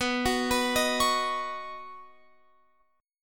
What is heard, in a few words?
B Major Add 9th, Guitar, Open F; arpeggiated; x,18,18,18,18,20